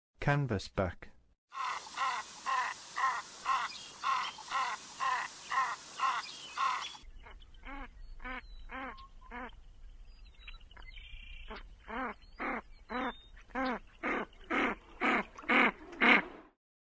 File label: Canvasback, Songs of Birds; North America; Western USA; Western USA Songbirds